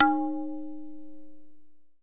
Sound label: open na, Tabla; Small; ETHNIC and WORLD PERCUSSION